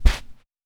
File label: Carpet